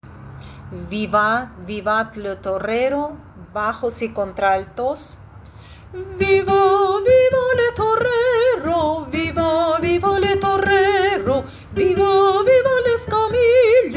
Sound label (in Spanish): Bajos Contraltos vivat